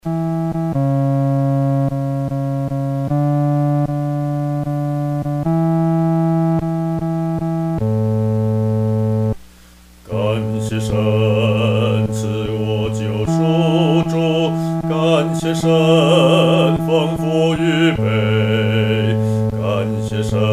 独唱 第四声; 男低

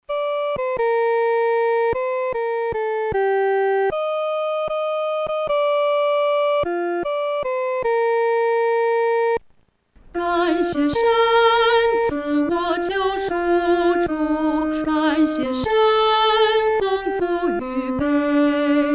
独唱（第一声）

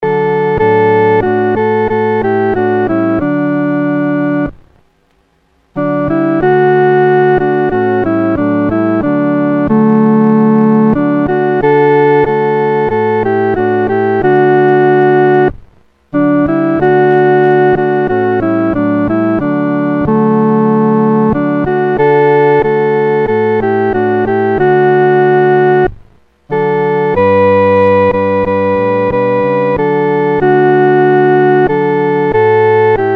独奏 第一声

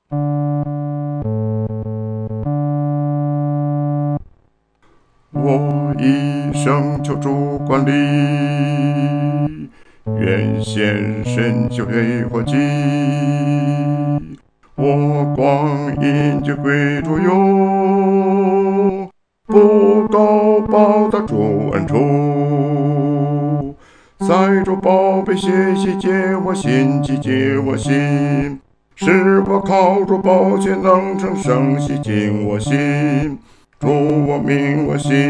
独唱 第四声

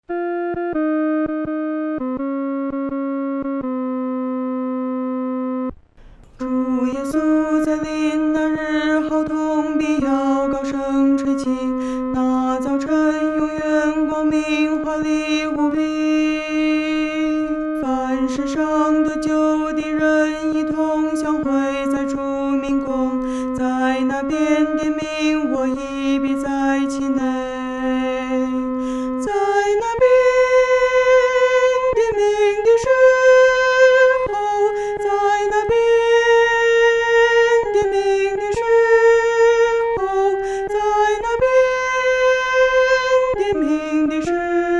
独唱 第二声

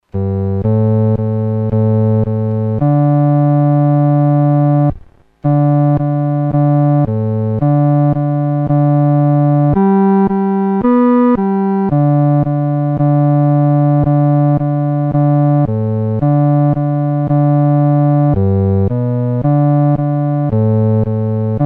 独奏 第四声

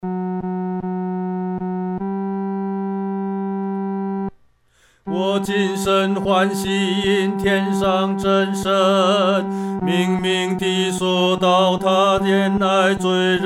独唱 第三声

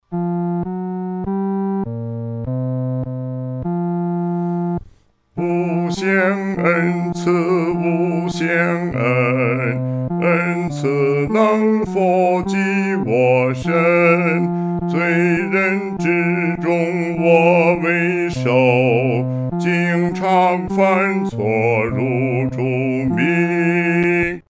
独唱 第四声

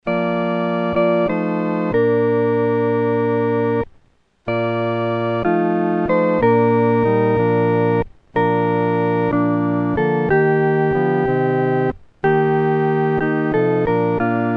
合奏 四声部

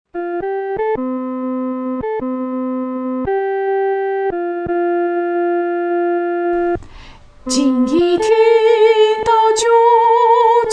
独唱（第一声）

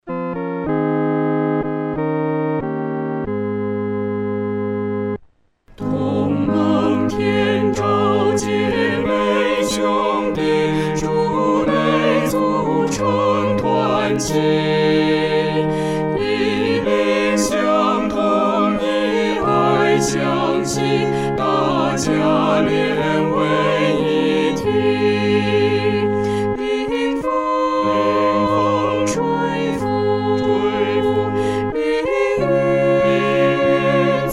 合唱 四声部

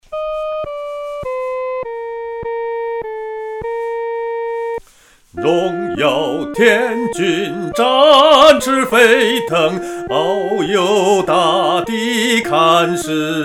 独唱（第一声）; 荣耀天军

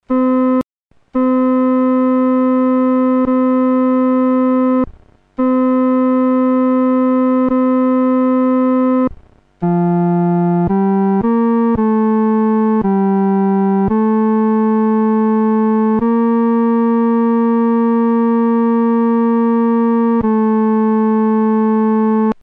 独奏 第三声; 阿门颂（三）

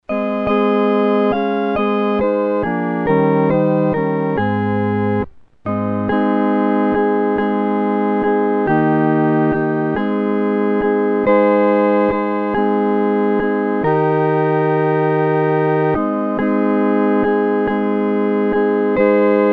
合奏 四声部

